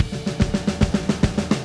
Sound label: 145 Texas Shuffle Drums; Pop Rock